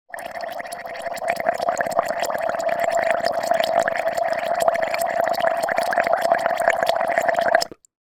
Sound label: food drink bubbles blown into almost empty glass through drinking straw